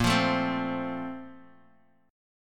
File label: Bb Major 7th Suspended 2nd, Guitar, Drop D; 8,8,7,5,x,x